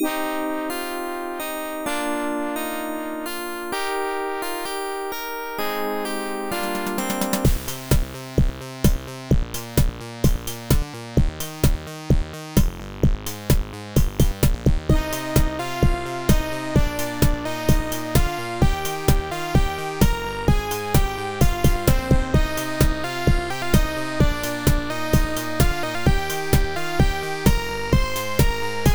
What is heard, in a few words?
Dance Beat, music synthesizer card demo songs; AdLib; IBM PC XT AT